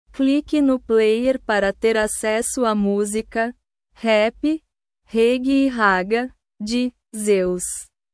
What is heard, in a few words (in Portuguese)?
rap reggae e ragga